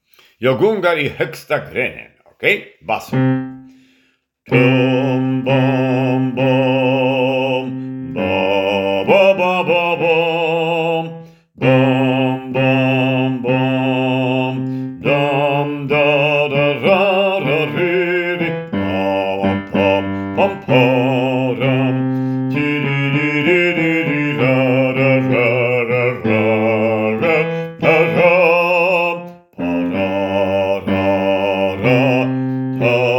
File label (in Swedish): bas